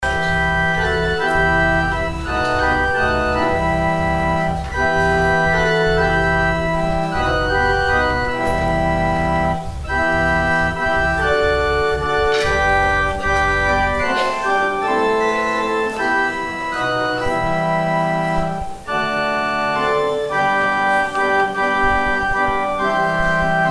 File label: organ interlude; Organ interlude during the offering